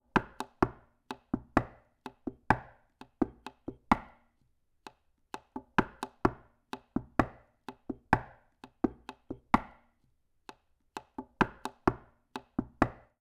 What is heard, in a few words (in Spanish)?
solea compas